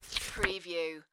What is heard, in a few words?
Magazine Cover Turn